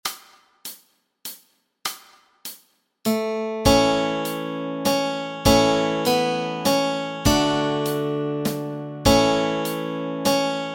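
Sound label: Melody and Chords Track, Easy Guitar and Ukulele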